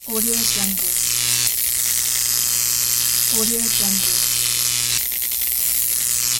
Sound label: electricity